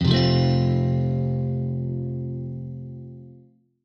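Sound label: F maj, Guitar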